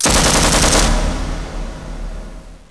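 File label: machine gun burst; cross x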